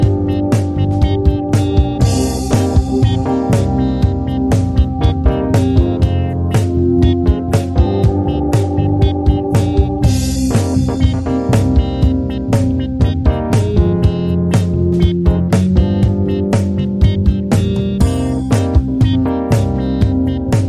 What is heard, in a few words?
Sexy Funk Seventies Groove